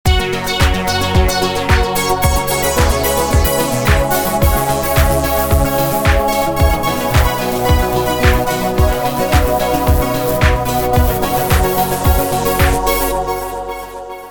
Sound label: siemens ringtone